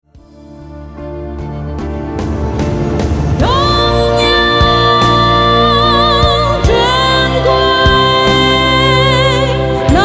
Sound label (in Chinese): 他們唱新歌 中文